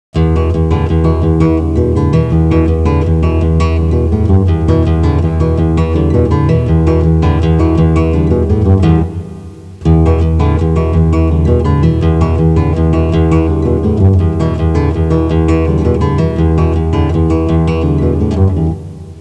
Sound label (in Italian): musica strumentale